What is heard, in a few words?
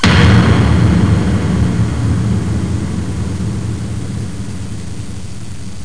bdrum hard; 22,372 sample rate; Amiga 8-bit Sampled Voice